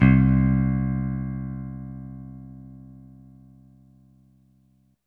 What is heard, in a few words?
60s clean guitar bass; yamaha reface cs